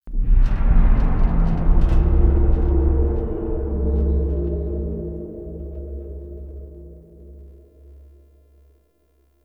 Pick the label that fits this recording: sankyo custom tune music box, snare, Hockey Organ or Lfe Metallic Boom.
Lfe Metallic Boom